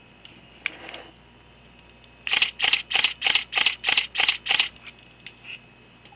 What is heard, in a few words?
EOS Kiss X4 Shutter Sound